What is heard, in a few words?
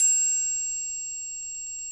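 concert, orchestral kit; Roland LCDP03 Orchestral Perc; Orch Combo 1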